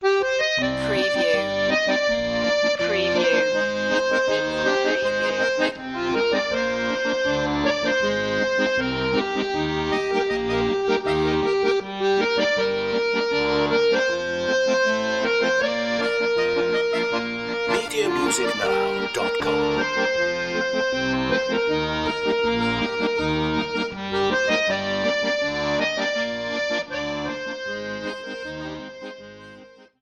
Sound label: cheerful accordeon; Eastern European